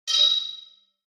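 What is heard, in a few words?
Pop Up Electric Bounce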